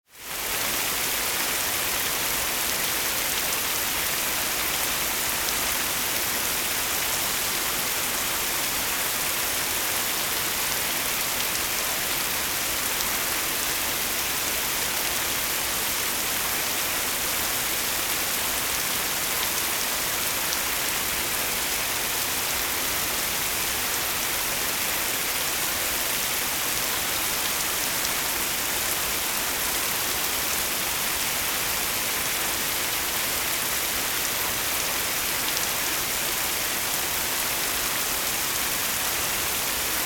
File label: Rain Heavy Ambience - Lighter Drops; Hollywood Edge; sound designer's toolkit v2